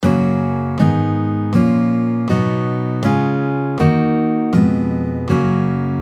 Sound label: F Major Chord Scale, guitar